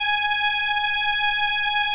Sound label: ELKA, String Machines; ELKA RHAP